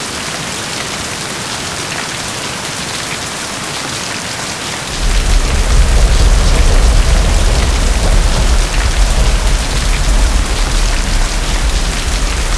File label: Ambience Rain; bog nomercy